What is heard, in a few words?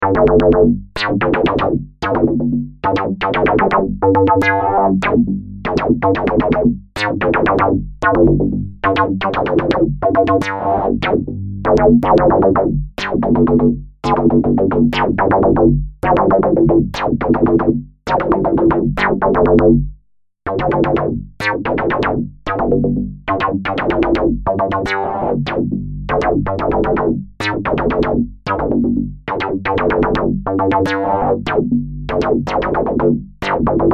Bass unison test